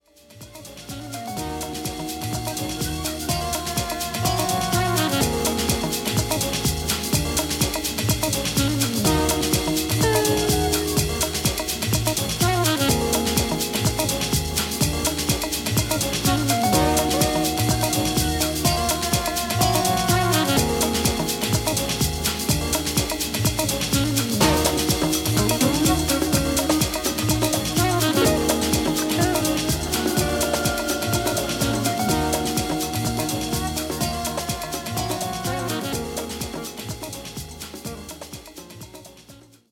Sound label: jazzy Tune; GVB